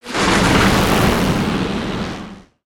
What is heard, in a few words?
Lightning Swing; Spinjitsu